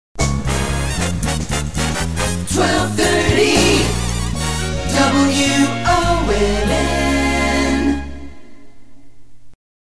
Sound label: jingle; WONN; 1230 WONN